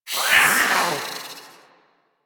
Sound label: Feral Vocal Attack Medium; Warframe; Catbrow